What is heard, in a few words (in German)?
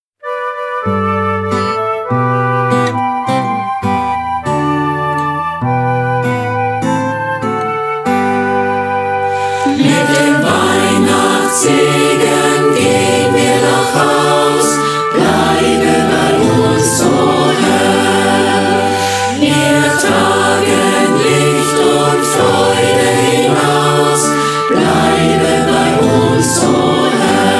Schlussgesang